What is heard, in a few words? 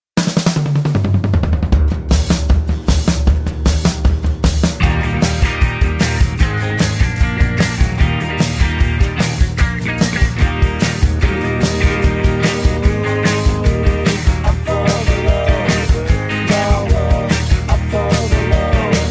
Eb No Guitar Solo, backing tracks